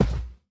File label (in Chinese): 熊猫脚步声